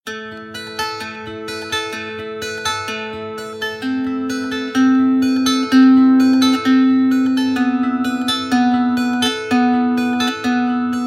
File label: meerklang leier scale sequence; loops